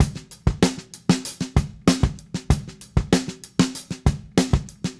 Drums, 70s Analog Funk

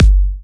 Sub Kicks, Bassdrums

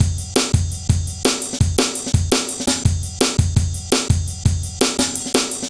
heavy live ride, drum loops; Zero-G Jungle Warfare 1, 2 & 3

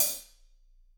HH, Drums; Bob Clearmountain Drums I

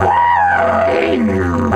F 140, DIDGE RIFFS

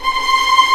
C6 TREM, VLNS TREMOLO, String; East West; The Ultimate String Collection

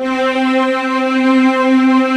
ACSTC STRING, JV 1080; Pro-Rec - Synthsonic

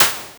POLYMOD; AMG - Vince Clarke Lucky Bastard

